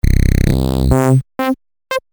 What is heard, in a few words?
horns; amiga; MUSIC TRACKER